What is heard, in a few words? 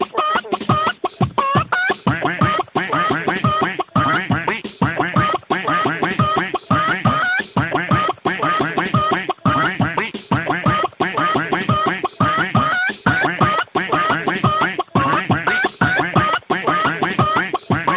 puk puk funny background music tone ringtone